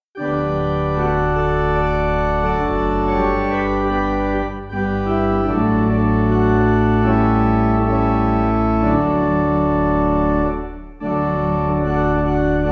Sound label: Pipe